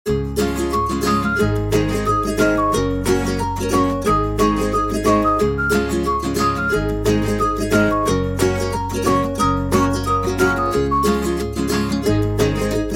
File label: Happy Summer Background; MIUI12